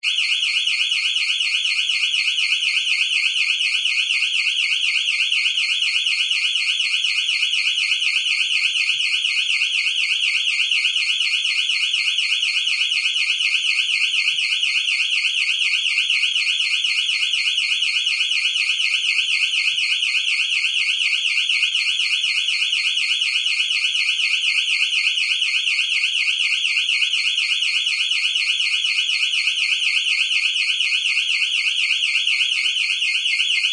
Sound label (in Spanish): alarma de un local